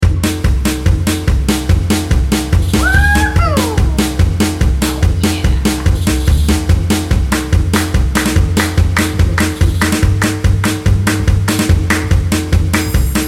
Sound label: Bluegrass